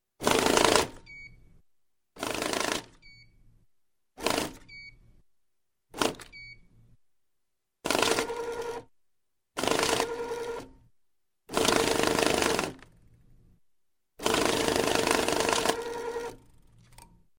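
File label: banknote counter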